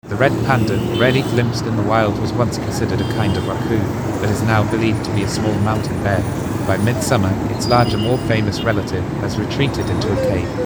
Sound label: Kampala street